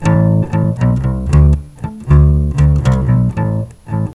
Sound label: Acoustic Bassline